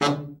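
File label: baritone, BARITON SAX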